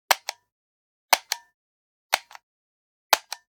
Generic Button Plastic Small; boom library; The Interface

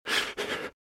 Breathe - Fast Heavy; RadwinIsland

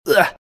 hurt; RadwinIsland

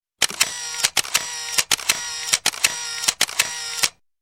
Camera 35mm Automatic Multiple Shots, auto Advan; auto Advance; Sound Ideas 2000 Series

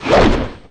Big Whoosh Quick; SFX Kit; Foley - Misc

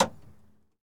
Truck Expedition Hood Open; Trucks

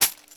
Coins Token Bag Hit; SFX Kit; Foley - Misc

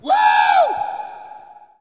Hockey Male Woo; Hockey Vocal Sounds

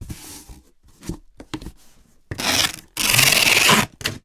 Packing Boxes, foley

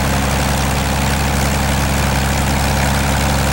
Plane P40 Inflight Loop; SFX Kit; Airplanes

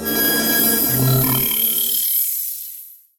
Menu Scifi Appear; Menu FX

